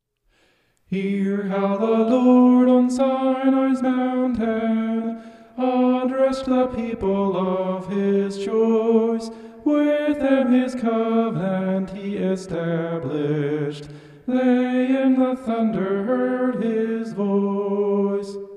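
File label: vocal homophonic; ten commandments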